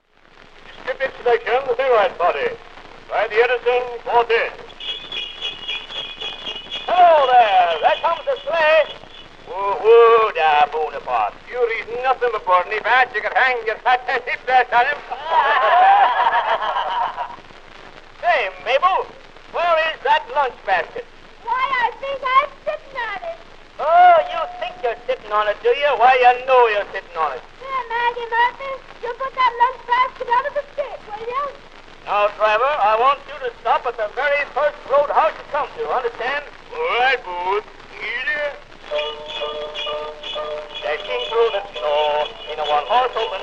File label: Male Quartette Sleigh Ride Party Jingle Bells; Edison; 1898